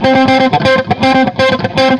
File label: F Picker In C, Guitars